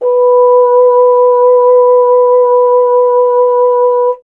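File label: BRS F.HRN, Solo Instruments, French Horn; Miroslav Vitous; Solo Instruments VOL-2; Solo lnst. II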